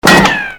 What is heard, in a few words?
good pain; CAC ASSETS